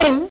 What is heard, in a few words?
BONK; dogz